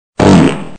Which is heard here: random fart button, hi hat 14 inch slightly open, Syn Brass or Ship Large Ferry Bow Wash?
random fart button